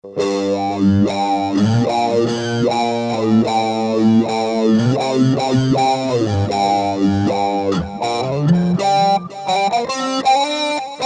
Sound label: PEDAL; Digitech RP200; Factory Presets